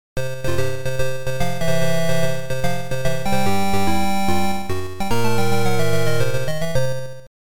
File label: game start